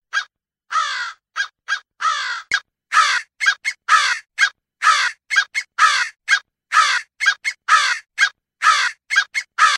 Caw Caw

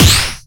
Parry; Smash

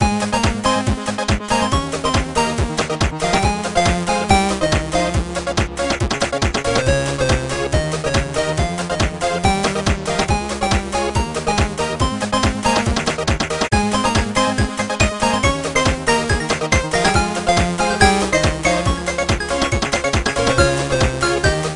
8-bit theme

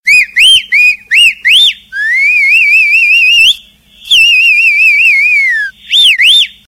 Sweet Whistle

